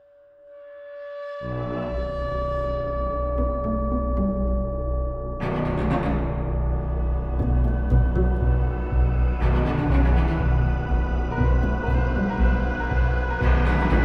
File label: thriller horror; Jamie Coleman, Film Composer